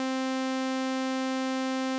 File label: ARP 2600 SAW